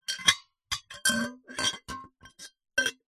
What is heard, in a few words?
household empty metal cocktail shaker bash against drinking glass; zapsplat